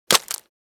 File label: Paint splat on the wall